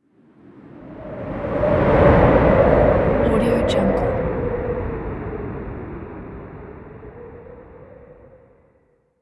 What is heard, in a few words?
winter wind gust